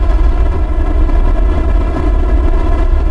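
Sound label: fast loop, engine; ships; Quantum Redshift; XBOX; kumiko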